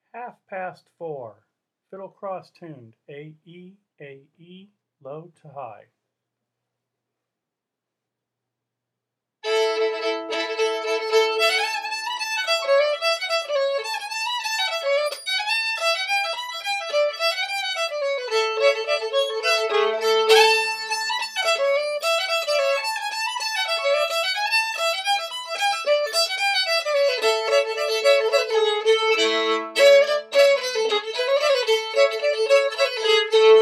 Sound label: fiddle; festival tunes